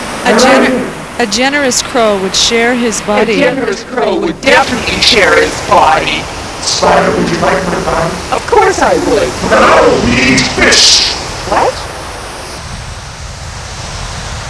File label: A Generous Crow